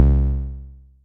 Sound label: Detuned Lark, Bass; Ableton; Factory Packs; Synth Essentials; Collision